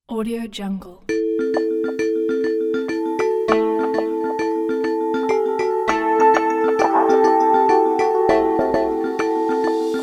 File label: new age detective